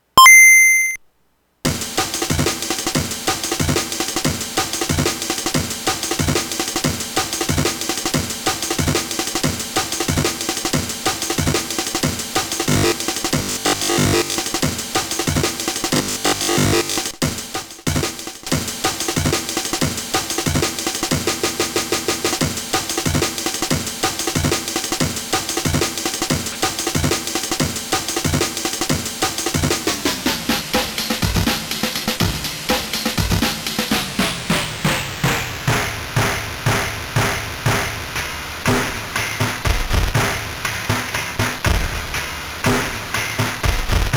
FPGA GB amenizer, gameboy sound samples

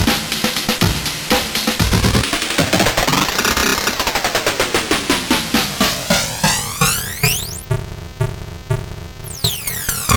FPGA GB amenizer flanging; gameboy sound samples

